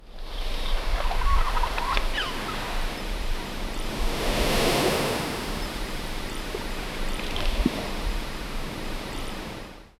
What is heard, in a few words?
realistic ocean shore amb